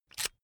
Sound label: electric drill template adjust heavy out; soundpool